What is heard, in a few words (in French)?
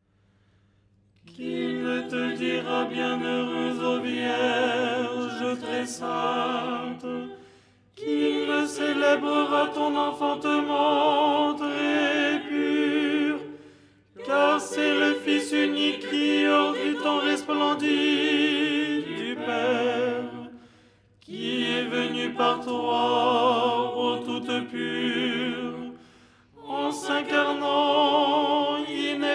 tenor; Théotokion dogmatique; Les 8 tons en français; ton 6